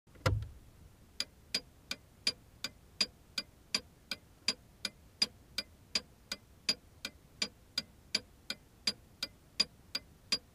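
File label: Car turn signal switching on and off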